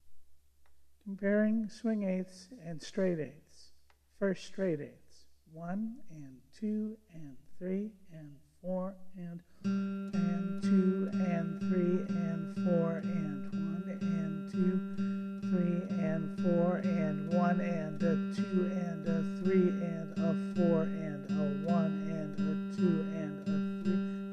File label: SWING, guitar